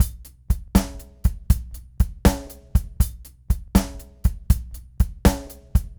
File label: Rocky Mountain Groove, Drums